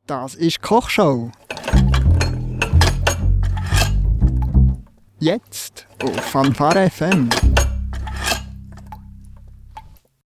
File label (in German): Jingle; Kochshow